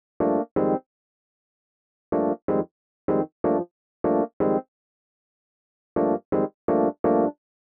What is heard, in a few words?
125 Rhodes A, Keys, Nu Jazz House; PowerFX - Nu Jazz House